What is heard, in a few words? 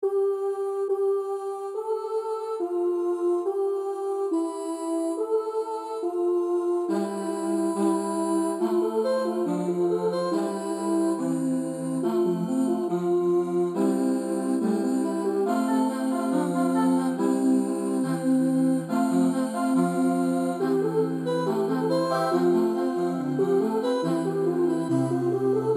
a lullaby sung in canon by a choir